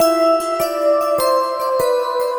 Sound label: Pleasant Keys, Trip-Hop Loops; Sonic Foundry (Sony Creative Software) - Rondo Brothers Trip-Hop Loops & Samples; El Corazon